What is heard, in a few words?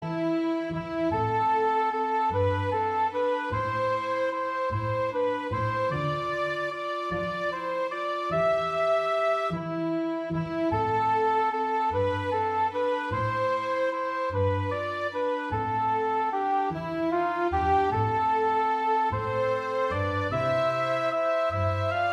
Ocarina Slow French Air; excerpt; Instrumentation: Flute 1, Flute 2 / Descant Recorder, Bb Clarinet, Violin, Accordion / Piano / Guitar, Bass; This beautiful slow air; folk band